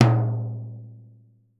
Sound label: repique; Definitive Percussion